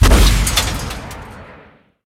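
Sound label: weapon missile launcher fire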